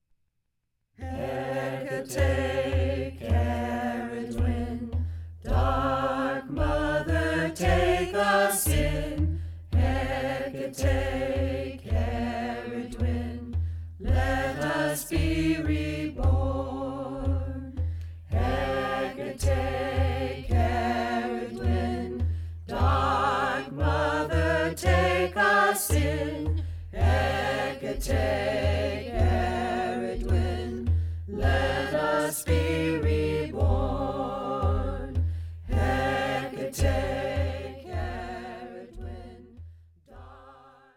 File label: Chants